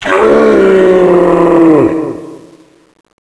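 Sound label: zombie revival death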